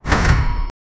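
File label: heavy futuristic drawer opening, space station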